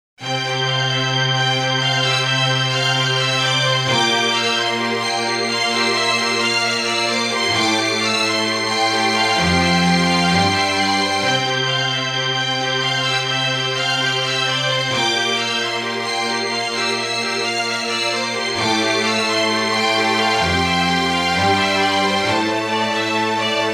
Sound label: String Thing